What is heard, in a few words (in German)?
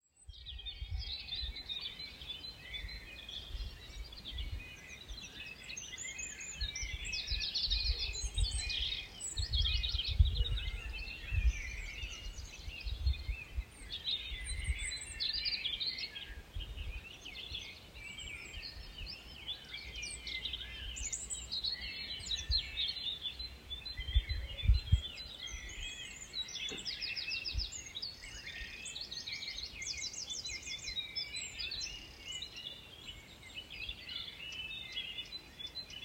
Vogelgesang Ardeche 6 Uhr morgens